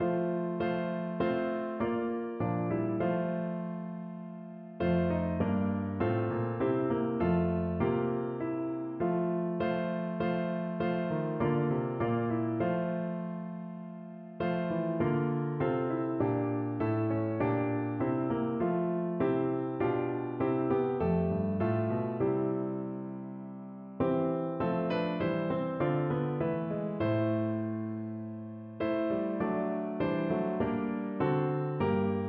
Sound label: now thank we, piano